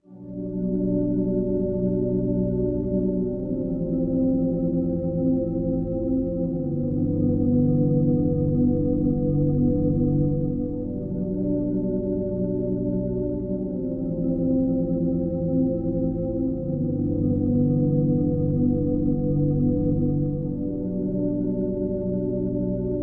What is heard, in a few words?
pad, ambient